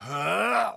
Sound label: Attack Charge; Batman